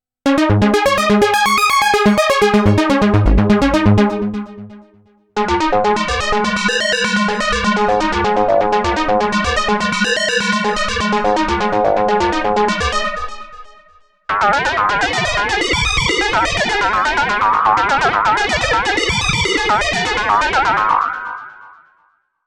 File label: Arpeggio Synth; Korg Ring